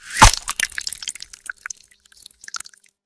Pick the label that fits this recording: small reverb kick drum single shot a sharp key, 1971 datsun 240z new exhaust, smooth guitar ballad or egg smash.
egg smash